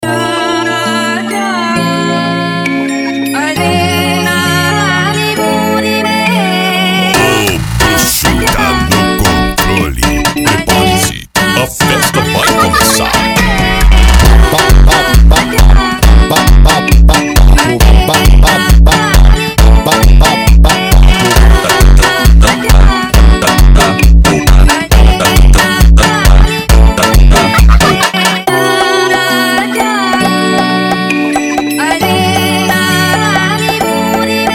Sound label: funk